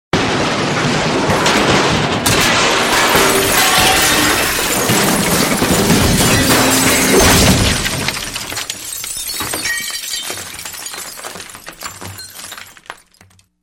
a wagon crash

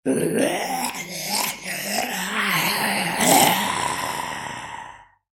zombie sound

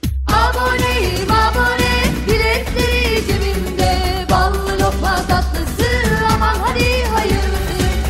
alert sound

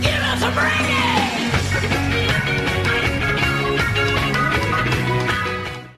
gimme some reggae

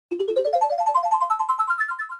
rainbow sparkles

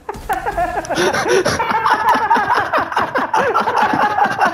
haha

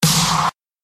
snare

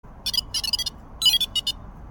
whoosh bike off

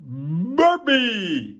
burpy

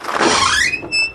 door opening sound; half-life

